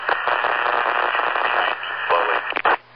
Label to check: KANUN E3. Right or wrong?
Wrong